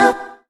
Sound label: Synth Shot E; Mutekki Media; Vengeance Electroshock Vol.2